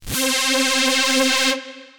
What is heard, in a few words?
Riser, Synth Lead; Hands Up; Ableton; Glitch and Wash